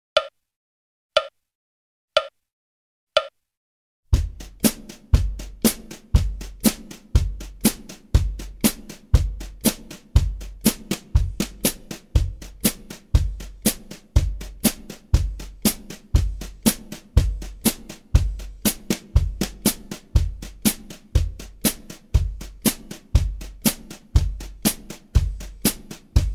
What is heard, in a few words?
Country Rhythm 60 bpm, Guitar; Guitar Aerobics Disc 2